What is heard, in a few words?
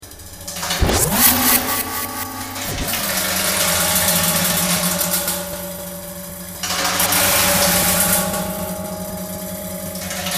corrugated iron solo; Jon Rose; pannikin